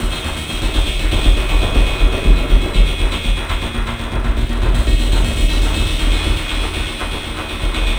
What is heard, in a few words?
Darkwave, Processed Percussion